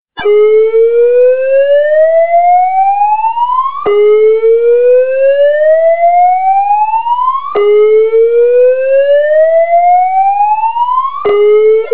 slow whoop

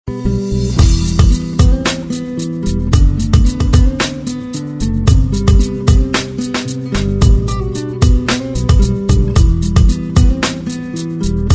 beat

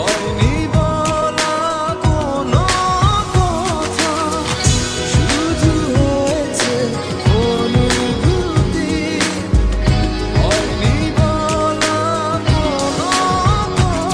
best bengali tone

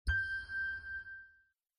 sony notification ringtone

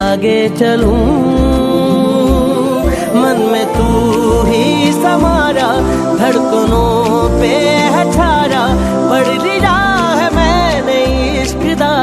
pakistani singer ringtone

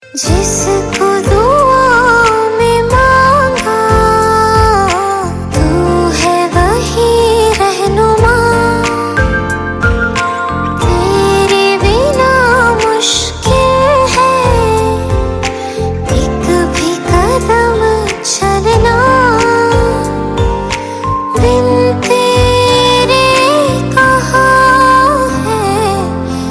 bollywood romantic female; aashiqui 2

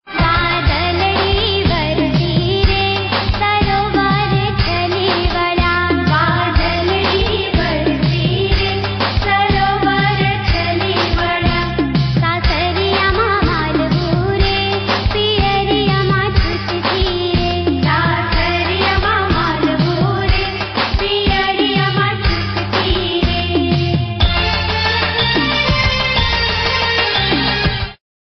gujarati ringtone

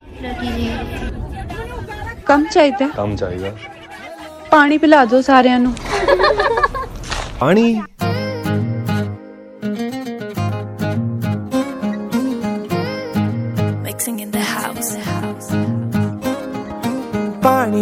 bollywood ringtone

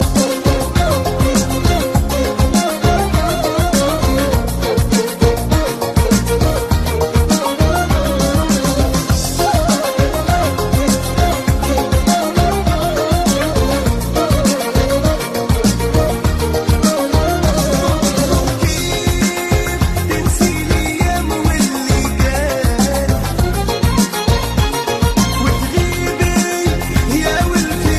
arabic super sound

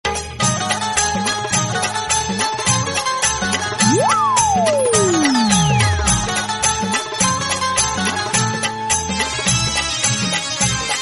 new Marathi music ringtone

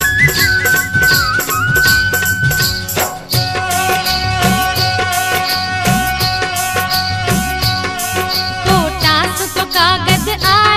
rajasthani song

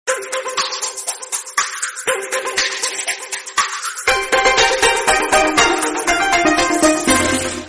chinese ring ringtone

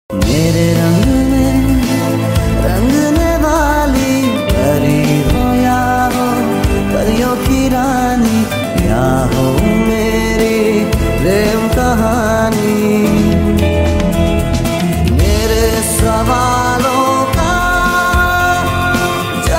unplugged cover

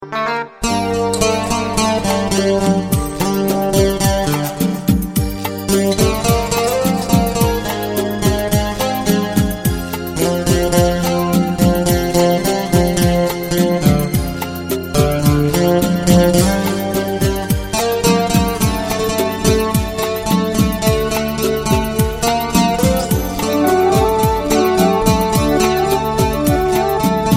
instrumental, ringtone

